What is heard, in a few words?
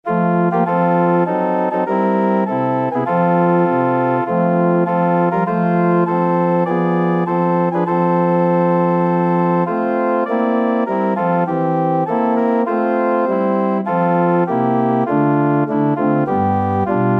weve a story, organ